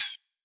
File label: Ride Shot